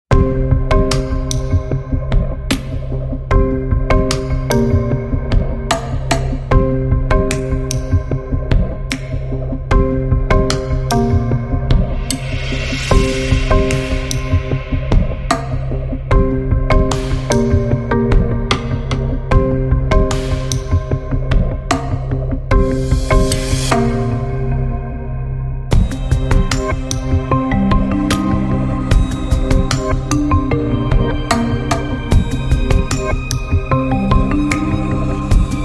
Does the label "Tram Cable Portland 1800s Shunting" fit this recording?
No